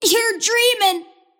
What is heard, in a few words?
deny pain; kidvoker; dota; invoker